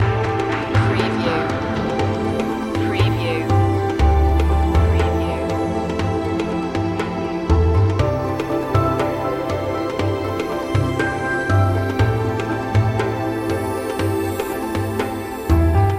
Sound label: eastern vibes loop